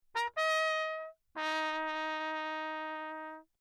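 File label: trumpet mute signal; Lechner; Perinétventiltrompete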